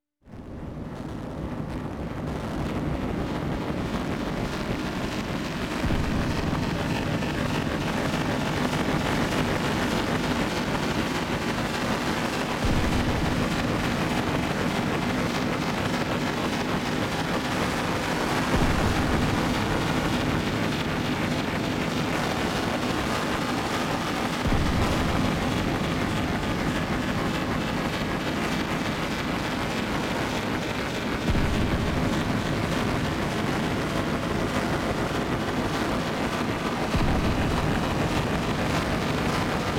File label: noise; drone